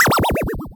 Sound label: death